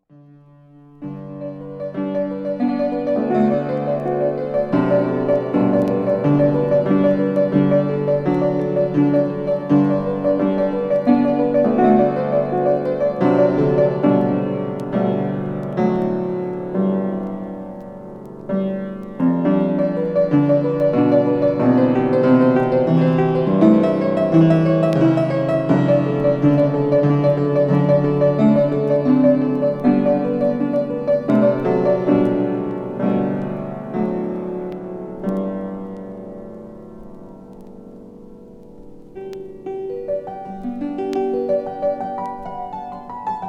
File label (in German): musik mechanisch